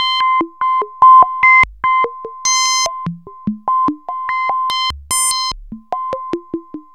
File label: FM BEAT, FM LOOPS; Zero-G Ambient 1